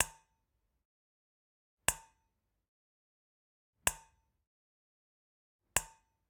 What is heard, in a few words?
Generic Click Glass Tiny